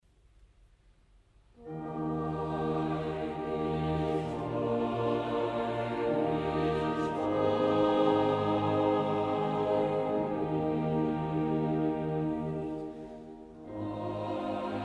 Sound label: Choir, Zum Sanctus; Choir St Augustin Vienna; DeutscheMesse